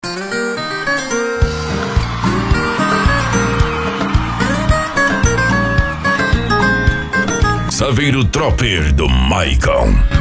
pagode de viola

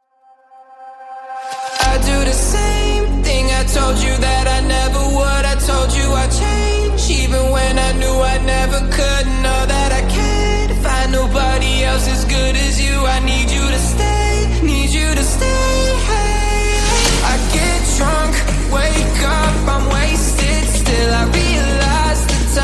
som automotivo

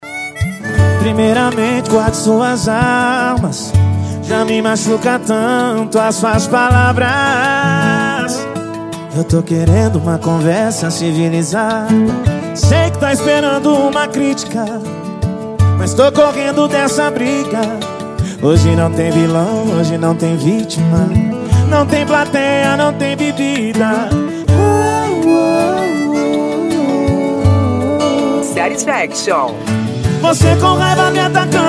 sertanejo

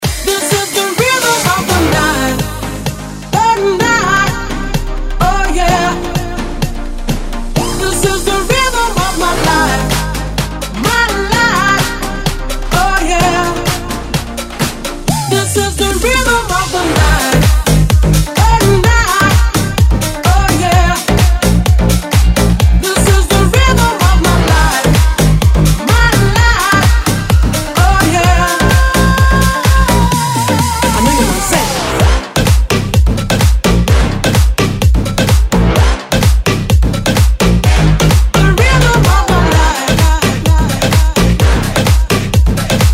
dance e funk retro; branco som

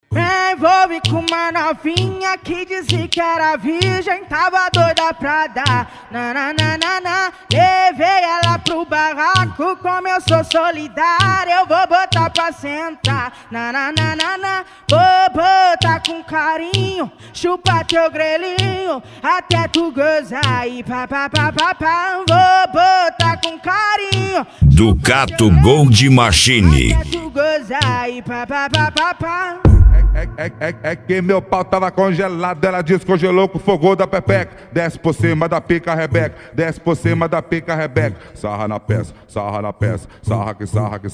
funk agressivo do sul

